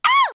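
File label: pixie hit; DAoC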